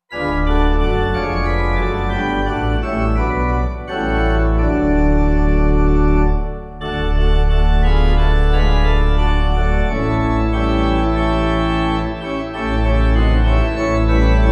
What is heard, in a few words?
A Little Talk With Jesus Wright Pipe